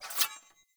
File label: Sword Accent Blade; Weapons; Player; Swords; Garren Sword